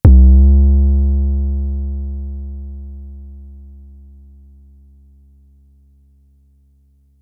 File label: FX, Drum Machines; Fricke Schlagzwerg